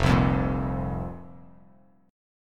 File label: F Suspended 4th F; Fsus4 strummed; 1,3,3,3; JGuitar